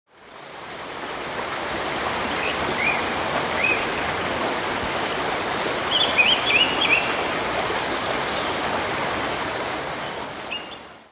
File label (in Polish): rzeka las